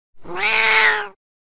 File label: Angry Cat Prank Meme Button; memes